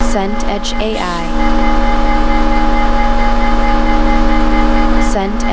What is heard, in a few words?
bus interior